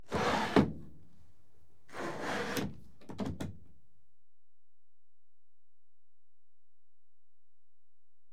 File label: Sliding Windows Closed; BBC Sound Effects Library; Age Of Steam